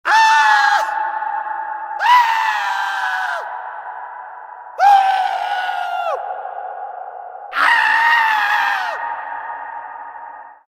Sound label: Screams Reverb; Infernal Radiation; Godspeed